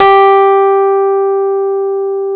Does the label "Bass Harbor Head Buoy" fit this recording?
No